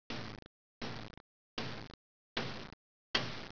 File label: mallet; 12000 Graphics Pack - A Complete Graphics Collection For Your PC